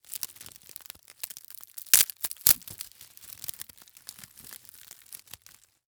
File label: Foley Wood Tree Branches Breaking And Crunching